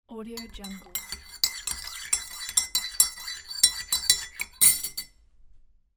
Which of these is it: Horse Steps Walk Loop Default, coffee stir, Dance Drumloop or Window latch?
coffee stir